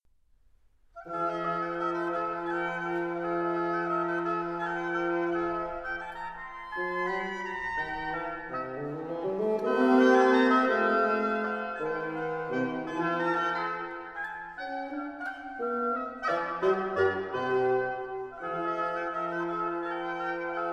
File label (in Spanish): Sinfonia in Fa M Quintetto; Gaetano Brunetti; Sinfonia nr23; Orquesta Barroca